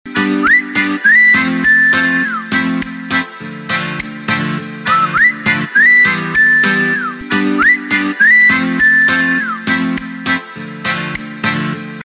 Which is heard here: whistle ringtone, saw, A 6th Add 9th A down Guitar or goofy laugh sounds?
whistle ringtone